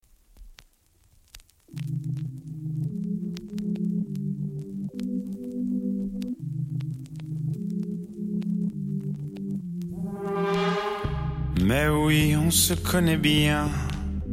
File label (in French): musik